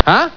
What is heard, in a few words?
huh